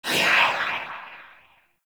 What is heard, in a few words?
Choir Dash